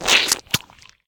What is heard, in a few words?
Ooze Attack; SyrinScape